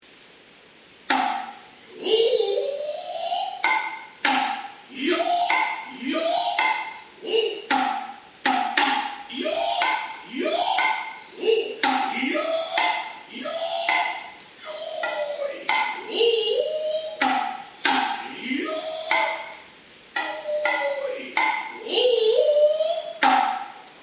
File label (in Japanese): kotsuzumi neiro